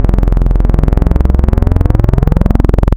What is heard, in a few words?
Phaser Saw, wavetables; Waves Codex